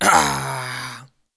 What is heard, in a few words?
worker die; propack